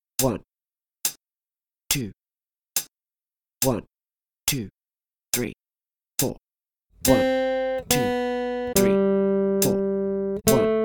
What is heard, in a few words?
3 strings, guitar